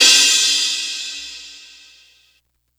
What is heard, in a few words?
long crash sound G key, Royality free crash sample tuned to the G note. Loudest frequency: 4726Hz